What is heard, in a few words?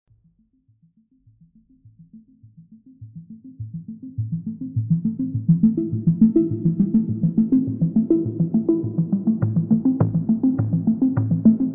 suspense cinematic hold on